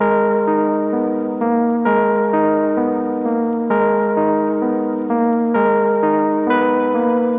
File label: Dark Melody Loop - 130 BPM D Min Chords; Cymatics; Oracle Sample Pack